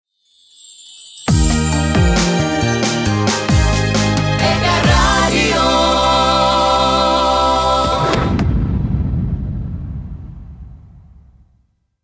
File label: jingle 67bpm